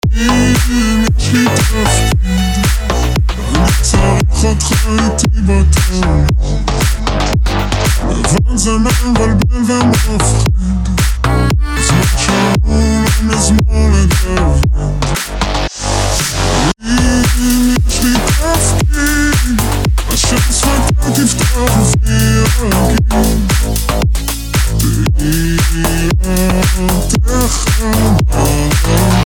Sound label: remix